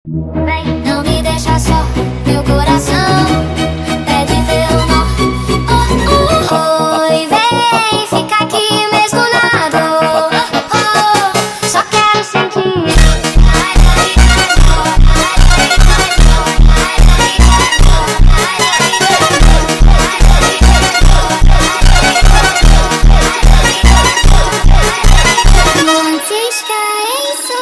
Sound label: Funk super Sped Up